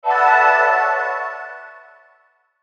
Logo Shine Reverb, Logo Swell Chimes Low; Sonic Logo; Blastwave

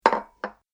Saucer Placed On Wooden Surface, dishware; Household Sound Effect; Andrew Fleming

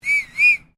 FEMALE WHISTLE SHORT; Female vocal sounds; Whistling Sound Effects; Whistling (Sound Effects:Voice)

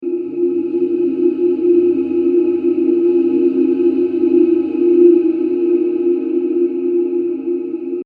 Mysterious Ambience 120bpm; Scary Soundscapes; Horror; Crafted Signals

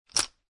Poker Chips Stacking

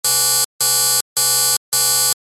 Electronic Alarm Loop; Alarms; Electronics

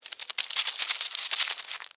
Insect Move Wet, Bug; Animal; Insect Movement; Blast Wave

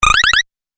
8 Bit Style Rapid Spring; Video Game

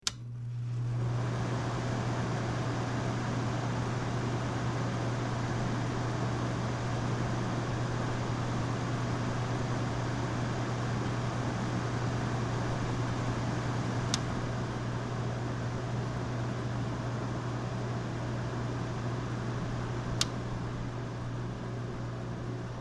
Air Conditioner

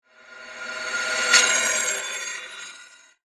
Horror Scrape Metal, Dark; Metallic; Scary Soundscape Sound Effects; BlastWave

